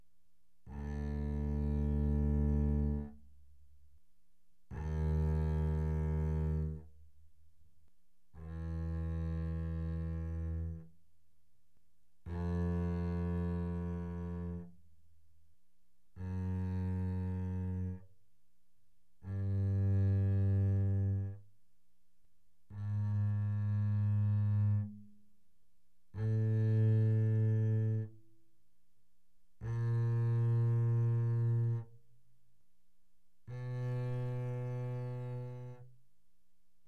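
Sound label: Bass arco mezzo forte sul D D2 B2, doublebass; Strings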